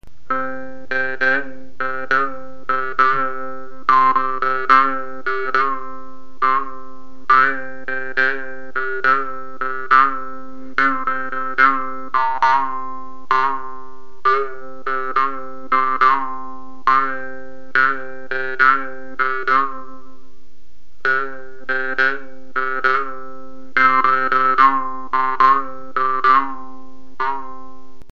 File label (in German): pralltriller